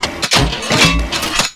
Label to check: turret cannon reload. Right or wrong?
Right